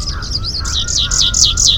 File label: Two different songs of the Grey breasted Prinia; Shivam Chitnis and AK